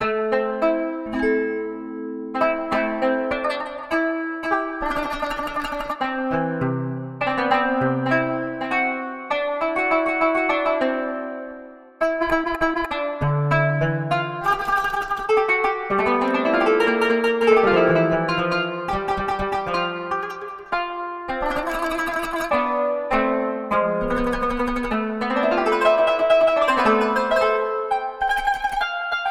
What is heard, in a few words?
qanun historic tuning; ganassi 1543; basam ayoub qanun